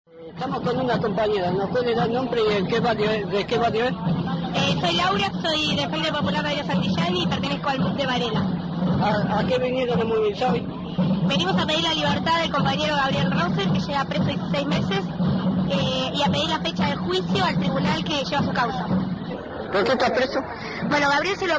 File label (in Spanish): marcha